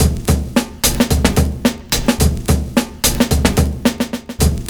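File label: BOND bass drum, LOOP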